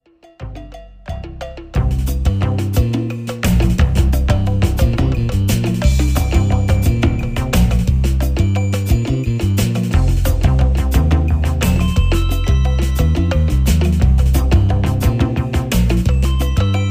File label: BLUE SAMBA